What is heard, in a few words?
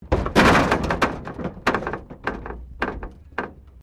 Wreck Door Panel Clunk Metal